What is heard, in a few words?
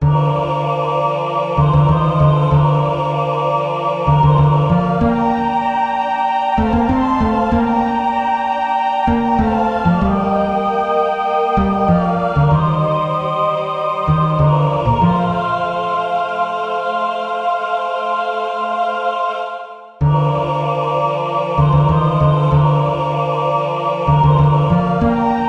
choir